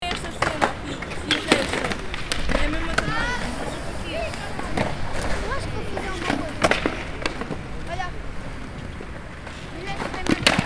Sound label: Roller skate rink playground rolling skates loop